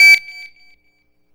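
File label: synth, Production Effects; Best Service - XXL Production Effects; SYN-FX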